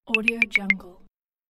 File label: app interface sound smooth tech